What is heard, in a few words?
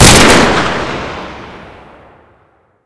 deagle; cstrike; ZP CRISTIAN; ZPB WEAPONS